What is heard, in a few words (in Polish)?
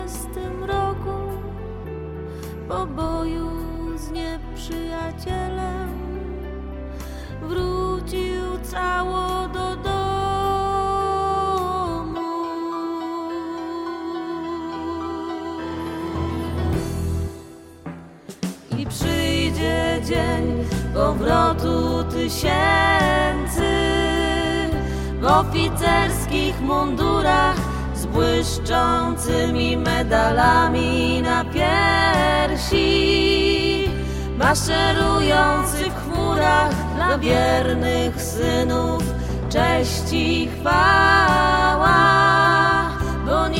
3 glosy